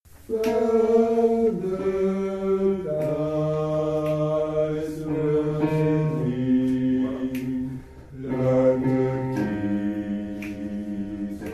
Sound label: Basses